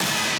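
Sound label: b stab1b, note G octave 5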